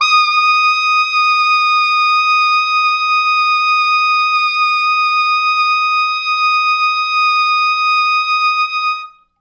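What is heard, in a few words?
tenor sax susvf ext. range, D#5; East West; Quantum Leap Horns Sax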